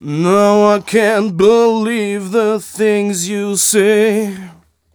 m 127 mel a, Vocals

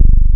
BASSES; Pro-Rec - Synthsonic

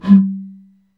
PRC BOTTLE, Bottle Blws; Roland - LA Composer vol. 2

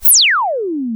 PER, TECHNO PERCS; Crypton - DrumBase5000

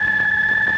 OPTIGAN A4; Sonic Reality; Vintage Timetraveler

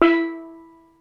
steel drum E2; ETHNIC DRUMS; Crypton - DrumBase5000

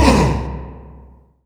C1, Synth Line; 90 s Sample CDs; NiceBeats; SynthLine VOL-30

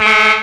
alarm; Ueberschall; Techno Trance Essentials

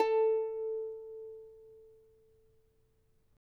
charango A3; Spectrasonics; Hans Zimmer Guitars VOL-2

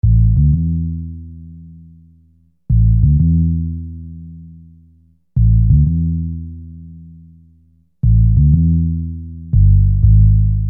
Sine Bass; Construction Kit; HIP-HOP; Sonic Foundry (Sony Creative Software)

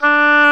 Oboe f; Roland L-CDX-03 Disk 1; Oboe 4-8

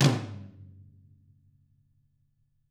TAMA TOMR, flam; ILIO; Double Platinum Drums 1

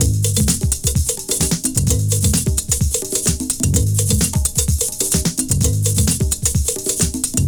128.5, LOOPS; Best Service; Hyper Dance; 90 s Sample CDs

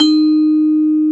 VIBRA C4; AKAI S-Series CD-ROM Sound Library VOL-2

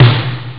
sdrum; ST-XX Amiga Soundtracker Sample Packs

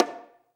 CON, ST CONGA; AKAI S-Series CD-ROM Sound Library VOL-5

